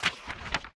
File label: paytable Show; imperialriches